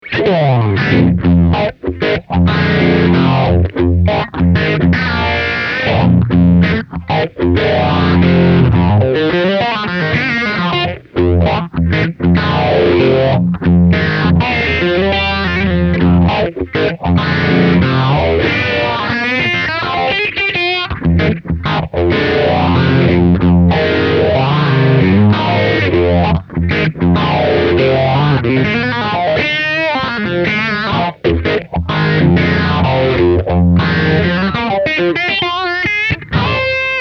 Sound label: phaser; Mobius